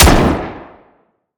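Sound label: Sci weapon Shot Medium Gun Chamber Rattler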